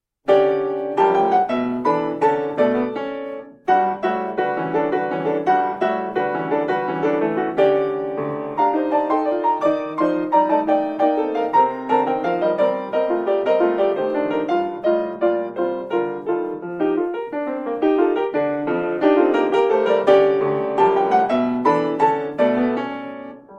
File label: Dance Music; Albumleaf 35